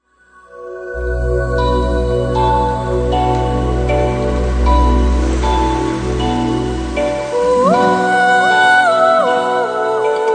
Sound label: Ringtone Mobile